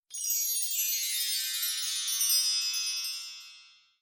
Magic Msg

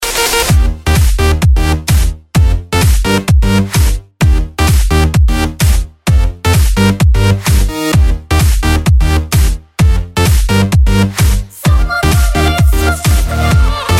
Clubbing

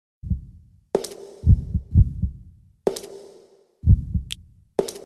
3 Clicks

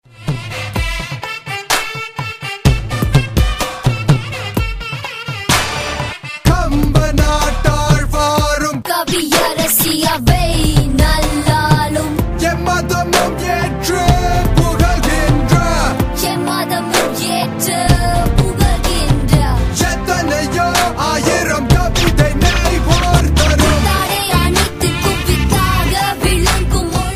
Tamil Semmozhi Rap

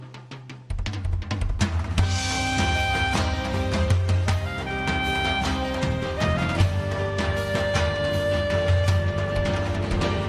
drum

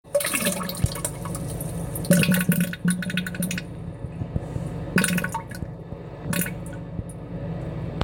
Mud Puddle

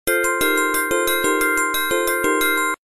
Ringer

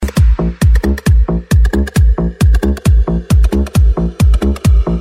Loops Sms

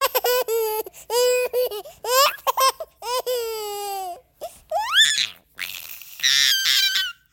laugh ringtone

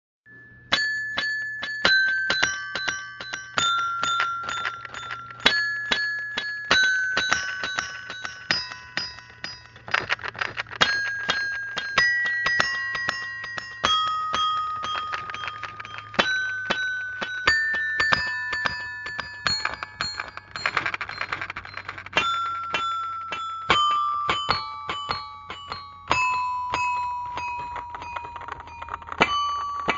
Minecraft Remix

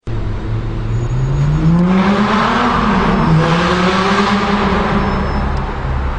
Gallardo Sound

